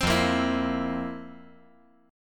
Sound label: F Major 7th Suspended 4th; 5,5,6,7; down; 5G,4C,5E,5A; chord shape